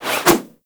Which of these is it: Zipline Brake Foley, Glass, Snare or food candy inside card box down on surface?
Zipline Brake Foley